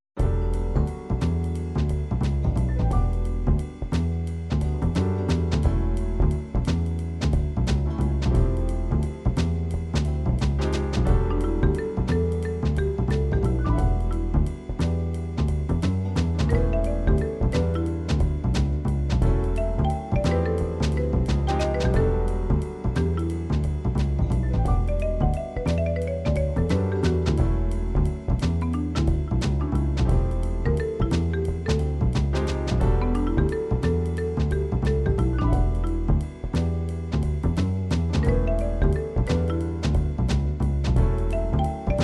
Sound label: jazz, midi